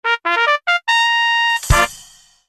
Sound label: unused song Cheep fanfare; NSMB